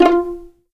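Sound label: F4 pizzicato MF, violins; East West; The Ultimate String Collection; VLNS PIZZ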